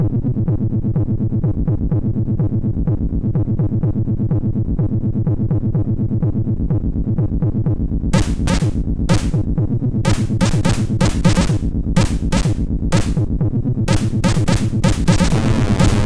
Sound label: House, sid MOS6581R4